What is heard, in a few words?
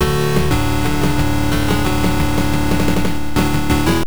Match Start; power eleven; turbografx 16